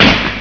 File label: ar shot